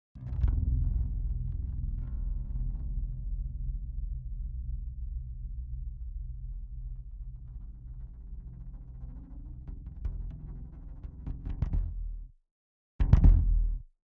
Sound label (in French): Stem 4, basse électrique, avant traitement